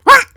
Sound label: Lurker Startle; Hollow Knight